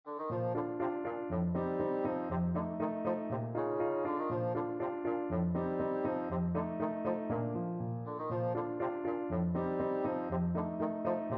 ecossaise beethoven, bassoon

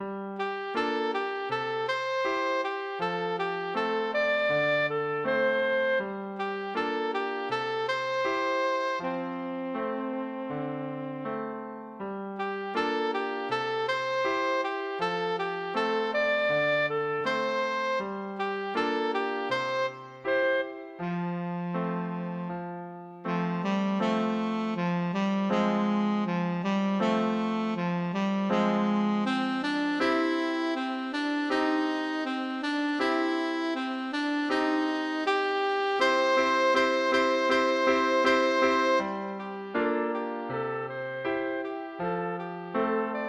freshtown rag, saxophone

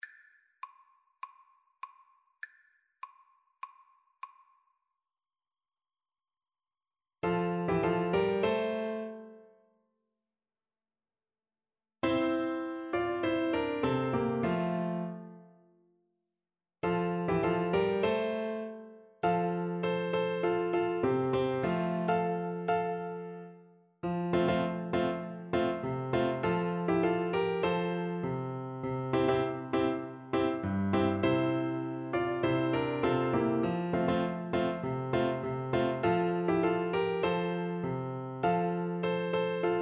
French Horn

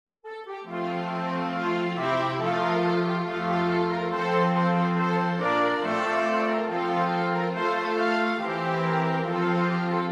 flexible mixed ensemble, 4 players

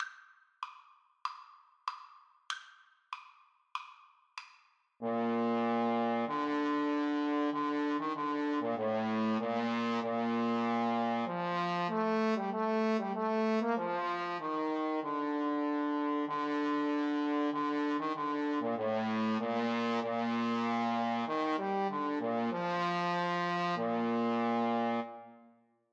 Trombone Duet

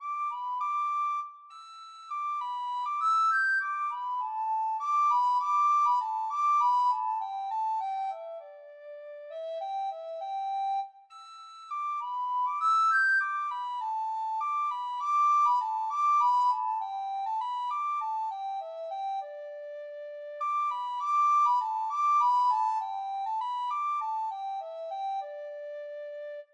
Cowboy recorder

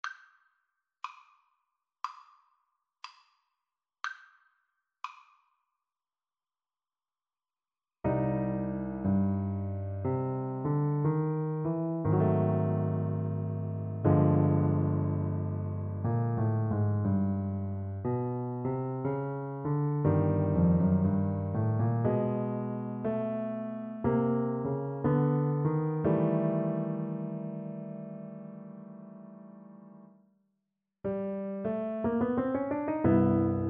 happy jazzy birthday, Piano Duet

